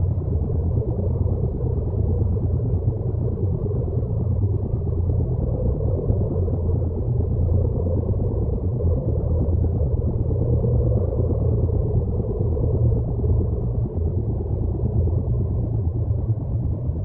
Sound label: glow atmospheric background